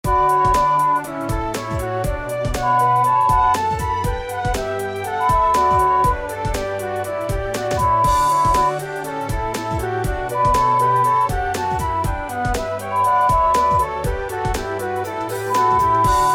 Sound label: Canon in D Major